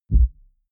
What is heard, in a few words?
sub bass soft